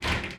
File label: Drawer Hit; Soundeffects